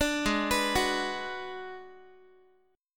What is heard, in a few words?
G sharp Minor 7th Flat 5th G sharp down Guitar; G#m7b5 arpeggiated; 17,16,14,16,x,x